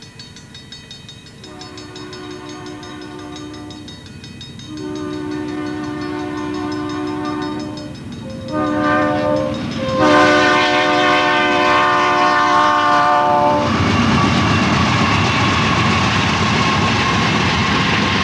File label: Diesel; atsf